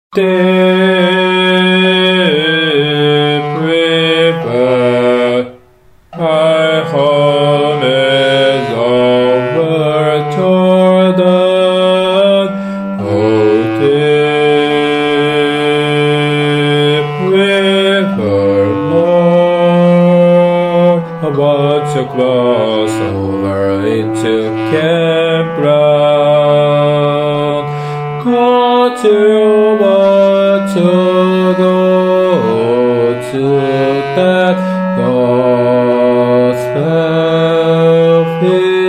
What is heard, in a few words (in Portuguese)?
baixo; deep river